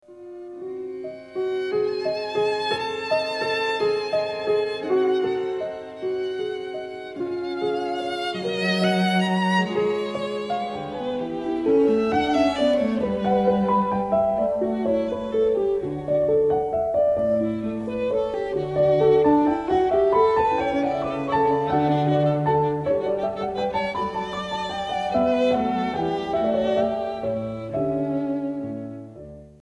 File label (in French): Medley Piano Guitare